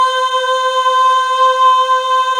GOSP. AAAHH

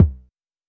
Polite kicks B1, Short; LIMAT Div Dept; Drums

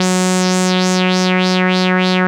WAH WAH F#3; Analogon

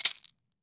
maracas; Cuba

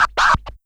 Percs, Elektron MachineDrum; SPS1 MKII

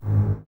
BSS G#1, String Ensembles, Basses, f Legato; Miroslav Vitous; 90 s Sample CDs

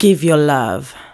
VOC, Disco Fever VOCALS

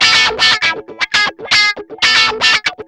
GUI, 120 BPM

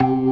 CLASSIC C3, Synth and Organ

